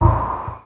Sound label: doors fx